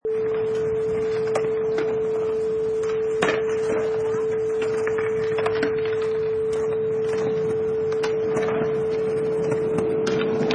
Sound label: Skate Park